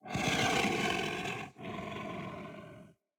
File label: Kubrow Feral Vocal Breath Walk